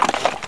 health; kingpin; items

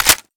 ump45 mag in

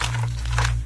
sniper draw; sfsniper; custom weapons; cso

